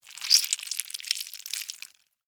Spider Bite Chew, Animal, Spider, Bite, Chew, Eat, Juicy, Wet, Arachnid, Insect, Bug; BlastWave